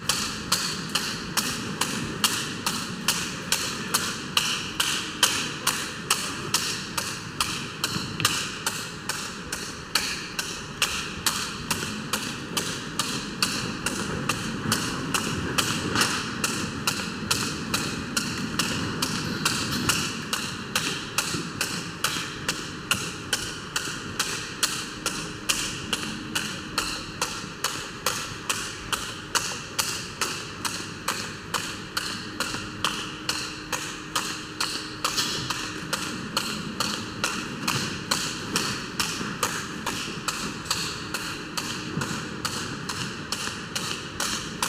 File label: Hammering, Tools, Tent, Reverb, Circus; AMBCnst; Circus Ambiences; Jake Fielding